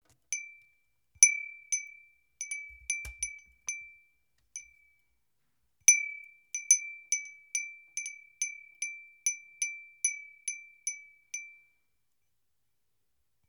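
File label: wind chime; furin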